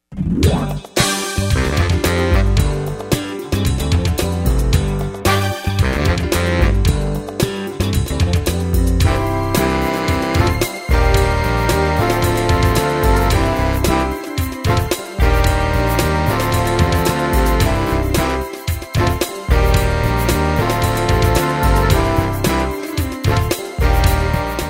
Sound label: upbeat; Cliff House Audio Music Samples